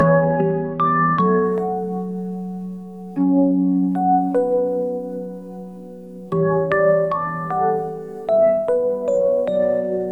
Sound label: rainy lofi